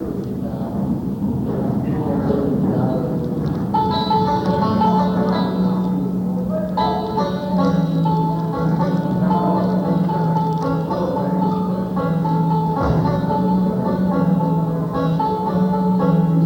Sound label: soundcheck